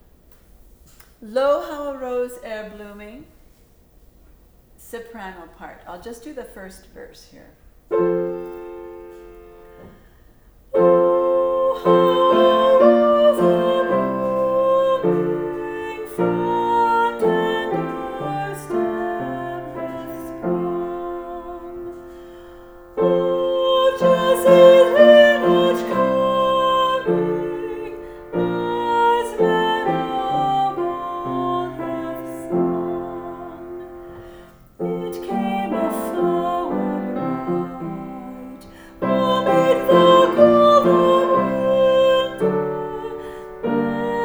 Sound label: soprano; Lo How a Rose